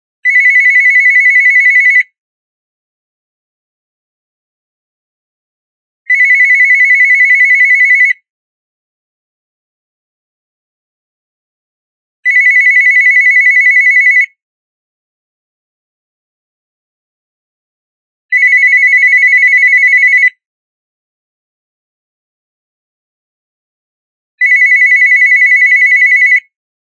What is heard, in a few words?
telephone